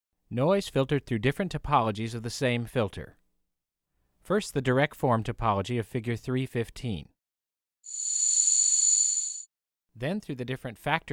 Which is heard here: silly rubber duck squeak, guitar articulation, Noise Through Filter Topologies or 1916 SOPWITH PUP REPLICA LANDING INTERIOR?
Noise Through Filter Topologies